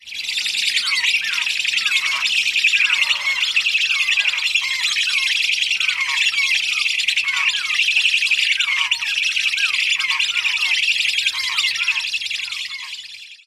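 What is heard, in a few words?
Anhinga begging young in nest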